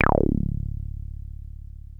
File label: 303 C1, TB 303